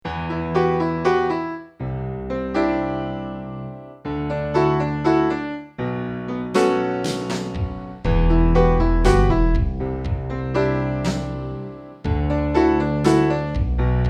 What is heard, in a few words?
Piano Interlude